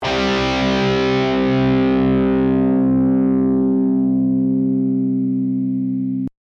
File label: Power Chords, guitar